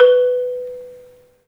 MARIMBA, B; 3D Sound; 3D Keys VOL-1

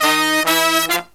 Killer Brass Riffs, 108 soul

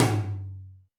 Tom Md Plate, Alesis D4 drum machine

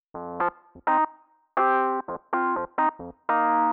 125 Rhodes C; Keys; PowerFX - Nu Jazz House

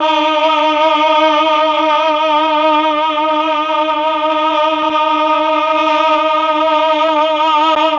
CHOR; ILIO; Propeller Island Legendary M400

